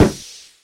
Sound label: balloon popp; mvm forever